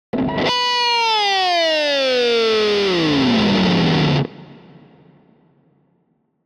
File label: Rock Guitar Distorted FX - Going Down Reef - Lower; Hollywood Edge; sound designer's toolkit v2